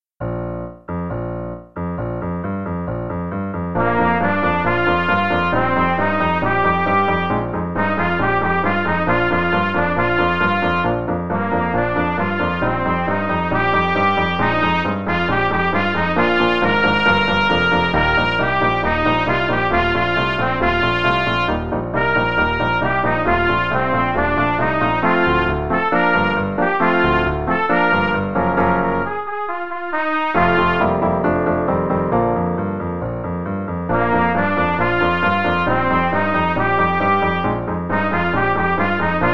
Steam Special (no click, qn=136) no dynamics, Trumpet; The Really Easy Trumpet Book